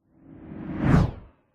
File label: science fiction vehicles zoom by ultra sonic whoosh